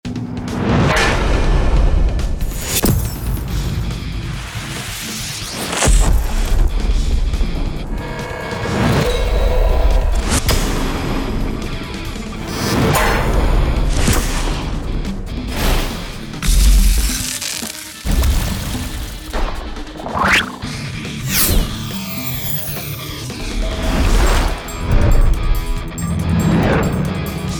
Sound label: Whooshes Stingers Hits